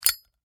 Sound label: Fire Zippo Lighter Open; Flames; Objects